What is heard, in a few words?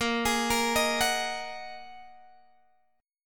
A sharp 7th Suspended 4th Sharp 5th down Guitar Open G